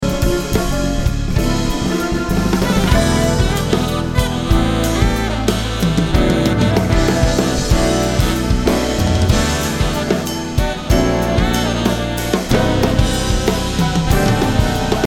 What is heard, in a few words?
Dance of the Ancients No Lead Sax or Solos